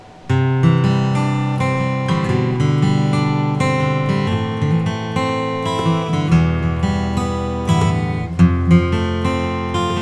paul reed smith

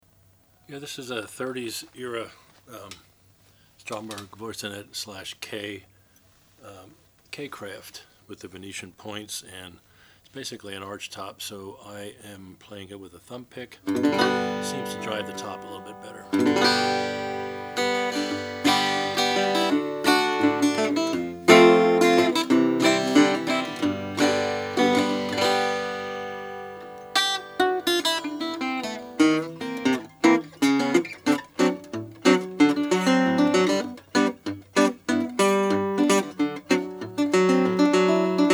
kay kraft style b tobacco sunburst

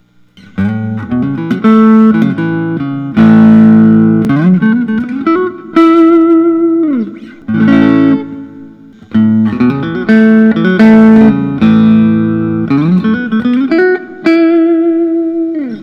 Artinger semi hollow custom; natural

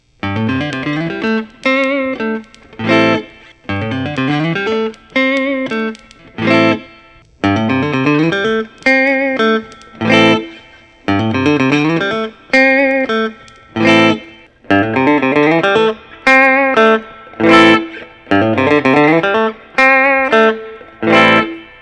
lentz traditional t brazil fiesta

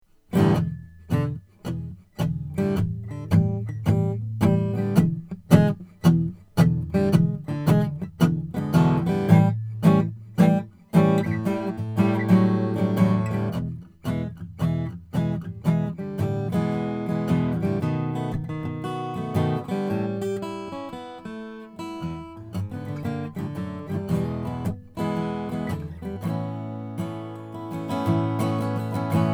froggy bottom sj 12 spalted maple

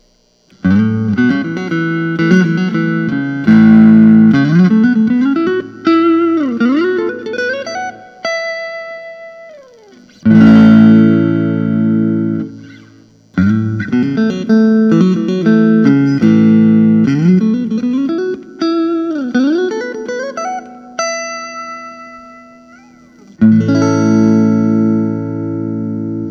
lentz reserve s brazilian lake placid blue

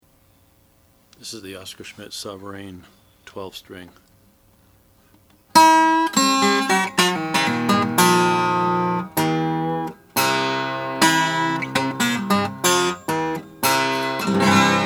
oscar schmidt sovereign 12 string natural

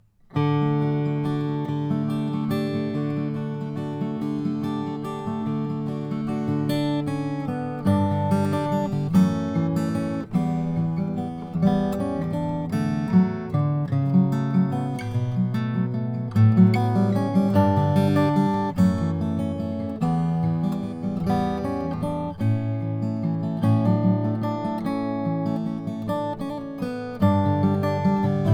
charis sj brazilian rosewood

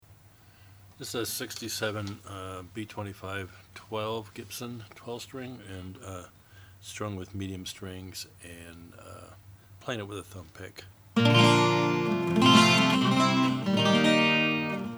gibson b25 12 natural

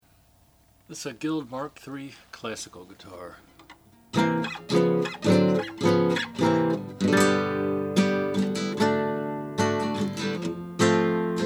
guild mark iii natural; 1972; classic

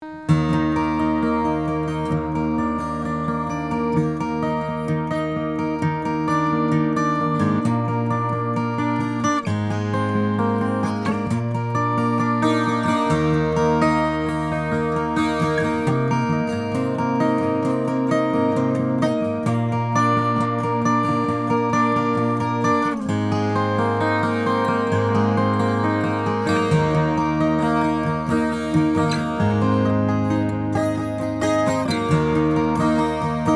yamamoto ms 12 multi scale

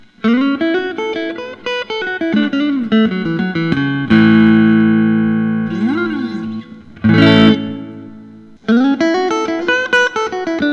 marchione carve top

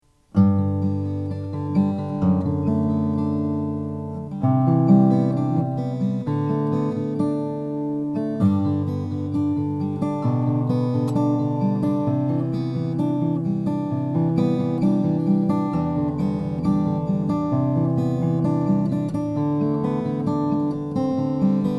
froggy bottom guitar all koa

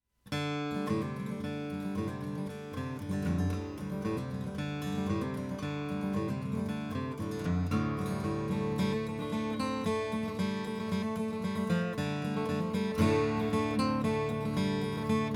froggy bottom j deluxe curly maple

